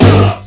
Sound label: bad disk